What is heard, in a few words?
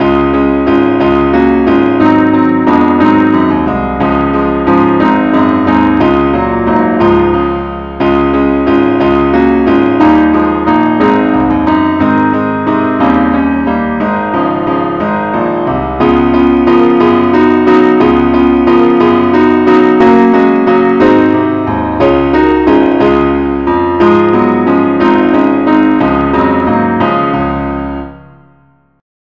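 keyboard only, key D flat; angelvoices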